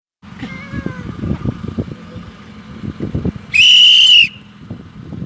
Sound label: whistle